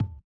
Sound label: tabla, Indiatek; Spectrasonics; Metamorphosis